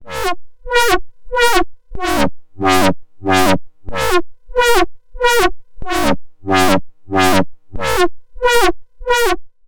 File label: SV 1 Nice FM Lifeform; Pittsburgh Lifeforms